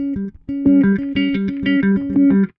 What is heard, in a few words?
Delay, Bass; Bass X